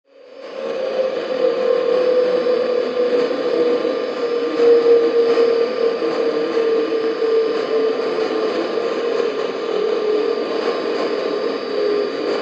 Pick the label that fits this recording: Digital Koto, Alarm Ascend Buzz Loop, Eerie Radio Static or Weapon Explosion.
Eerie Radio Static